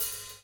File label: OHH, HI-HATS; Spectrasonics; Liquid Grooves; LG HI-HATS